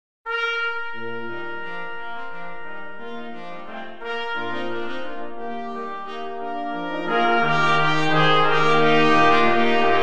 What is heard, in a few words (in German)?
blechblasquintett